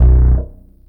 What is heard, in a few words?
Distorted, One Shot Bass